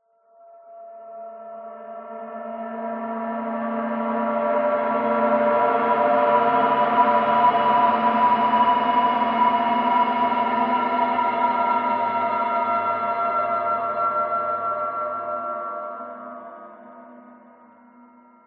swell transition slow cold metallic left right resonant; sound design accent; dissonant; Skyclad Sound